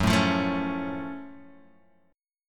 F sharp Minor Major 7th F sharp

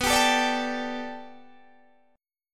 B Minor 7th Sharp 5th B Guitar DADGAD, chord

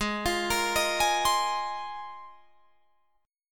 Ab 6th Add 9th Ab Guitar Drop D

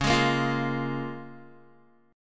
Db 6th, Guitar; strummed; 8,11,10,10,8,x

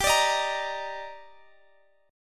D sharp Major 7th G

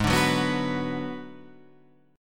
Ab Minor 7th Flat 5th

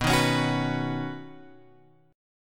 B Major Add 9th B, chord; Badd9 Chord {7 6 X 6 7 7} strummed

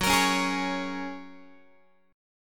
Gb Major Add 9th, down, Guitar; strummed; JGuitar

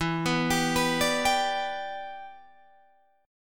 E Minor 7th, Guitar, Drop D

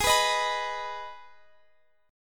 G sharp Minor G sharp down Guitar Drop D, chord

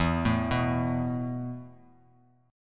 E Suspended 4th E, down; Esus4 arpeggiated

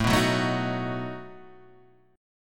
A 6th Suspended 2nd Sharp 11th, guitar; A6sus2#11 Chord {5 2 4 2 4 2} strummed

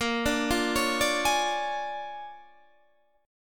Bb 7th Sharp 9th Bb, Guitar Standard; Bb7#9; arpeggiated; JGuitar

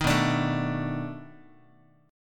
B 7th Sharp 9th, down, Guitar; strummed

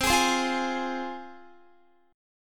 C Suspended 4th Sharp 5th, C, down, Guitar; Csus4#5 strummed

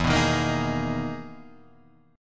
E Major Sharp 11th; chord shape

